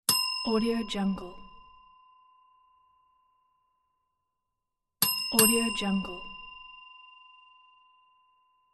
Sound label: desk bell; medusamusiclab